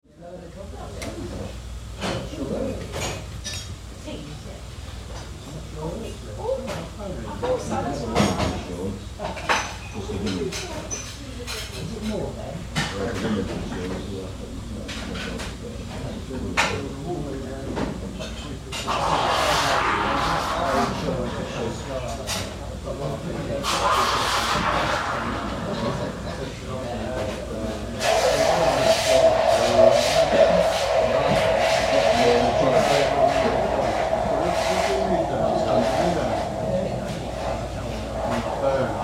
Market Traders Cafe, Small working man's café - low male voices; Cafeterias; Tom Hutchings